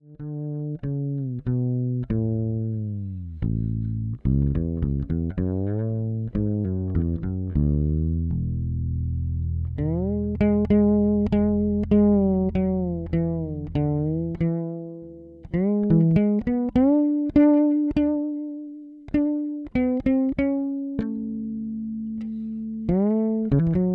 Fretless Sounds

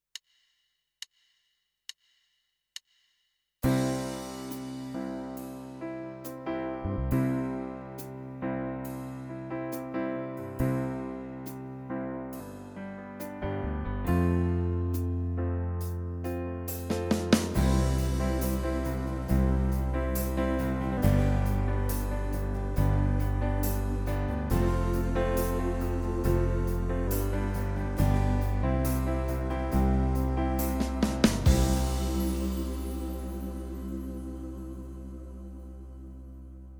CVP609 Styles

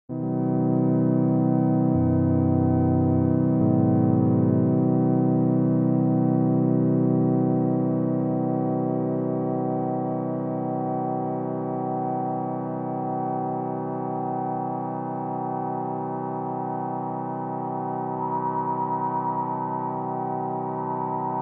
Analog Style

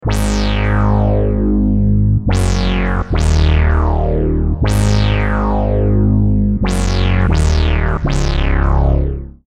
fat bass

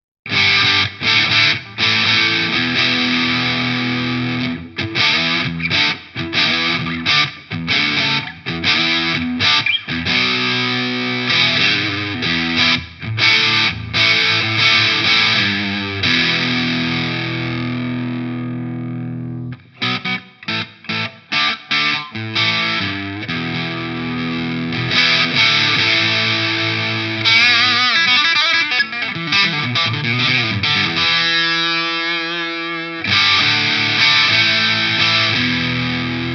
Hardrock Essentials; MOD Devices MOD Duo

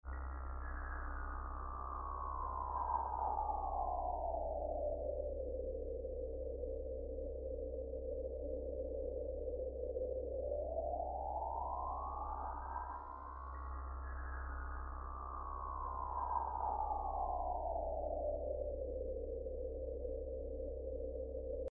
Kontakt7 soundscape spread

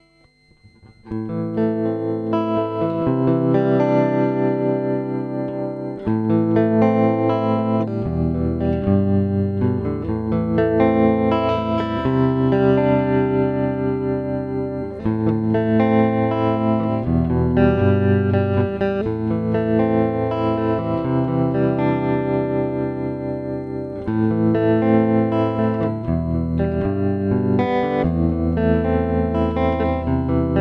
tremolo

